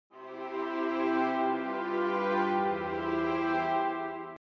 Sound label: String Orchestra